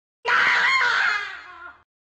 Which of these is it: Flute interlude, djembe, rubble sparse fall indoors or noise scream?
noise scream